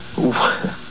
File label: oooph